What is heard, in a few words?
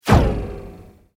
Sweep Mechanical Fast